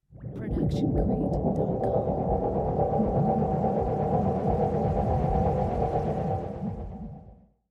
magical pulse; soundscrate

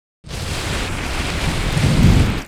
Flamethrower; SoundsCrate

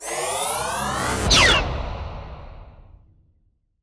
scifi weapon charge and blast

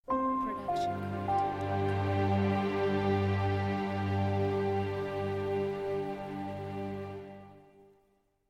Sweet Ident; SoundsCrate

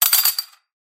coin drop metal tray; soundscrate

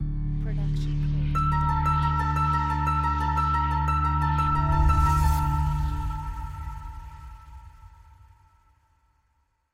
Mystery Harp; soundscrate

